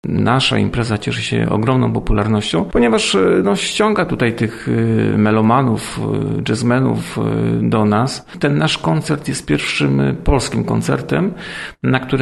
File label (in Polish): spotkania jazz; spotkanie jazzowe w rudnikach